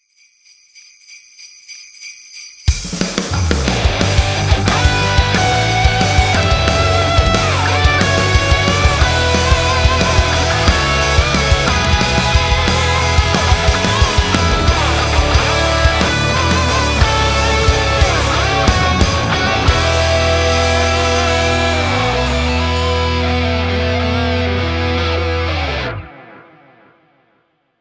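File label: podcast theme bells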